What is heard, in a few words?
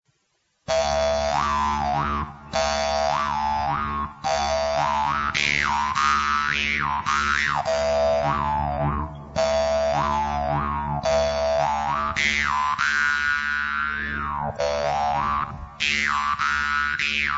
danmoi